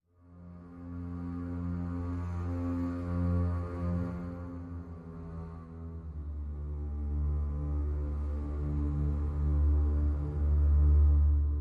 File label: Drums and Bass Mix